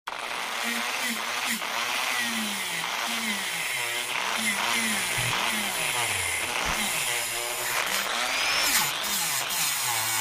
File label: dremel wire brush; dremel tools